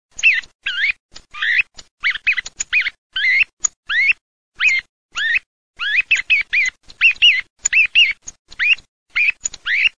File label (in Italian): TORDO